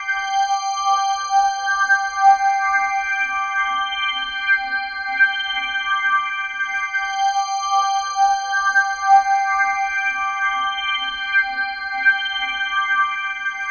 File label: Pad